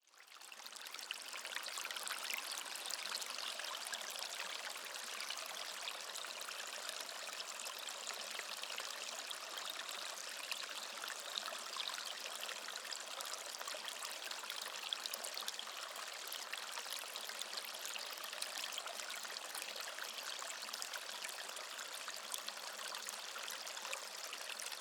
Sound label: water stream calm; Klukkende vandløb, 30 minutter